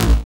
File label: BD, BD Dance